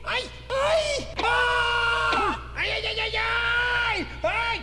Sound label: cocorico aiiiiiiiiiiiiiiiiiiiiii